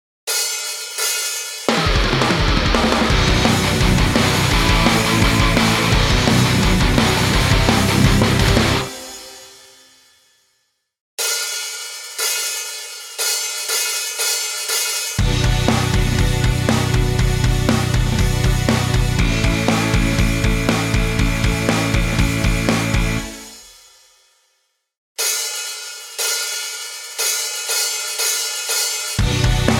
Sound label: karaoke